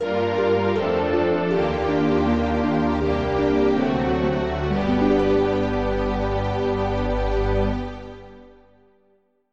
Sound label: Defeat; ycrpg